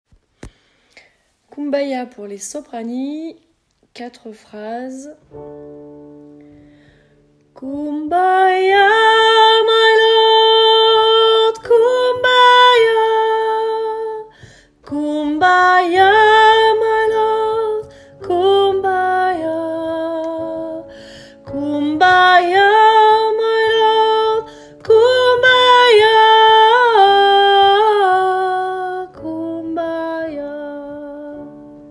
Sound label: Kumbaya soprani; pupitre soprani; enregistrements de travail